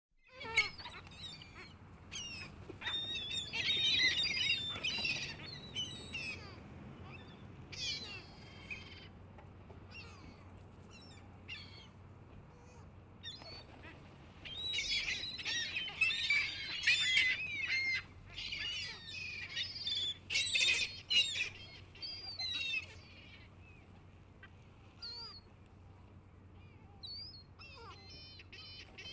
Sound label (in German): seemöwen, Am Meer